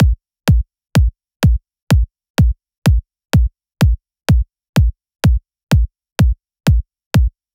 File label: Tech House Kit 126bpm Kick; EDM Drums; Drum Kits; Stems; Ghosthack; Ultimate EDM Drums; Tech House Kit 17